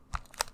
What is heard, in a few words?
Tiny Button Press